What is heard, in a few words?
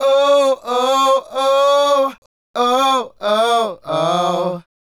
Male Group, Hip-Hop and RnB Vocals